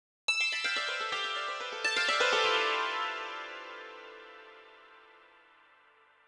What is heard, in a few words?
ethno, 125, A